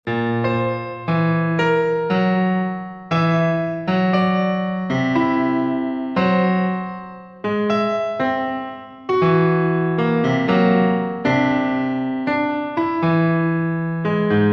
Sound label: whole Tone Piano